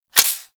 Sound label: Pistol Mech Clip; Guandao